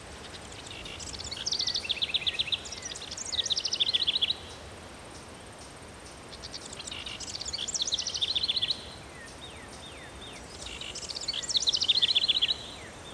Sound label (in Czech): ptaci; Mafie 1